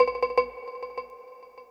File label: FX Industrial 140